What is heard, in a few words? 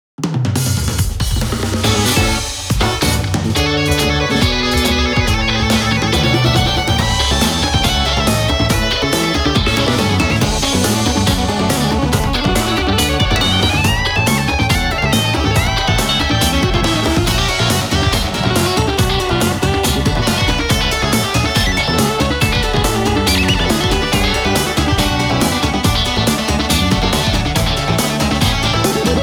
Valse du Petit Chien; Taiko no Tatsujin Atsumete Tomodachi Daisakusen 2015; Wii U; Puchipochi